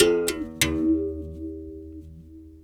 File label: Tar, World Percussion